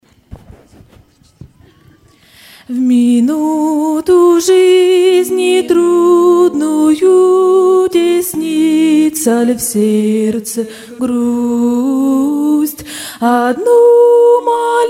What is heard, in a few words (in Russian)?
пение; Для семейных; Лагеря; 2013 Семейный лагерь; костер; О молитве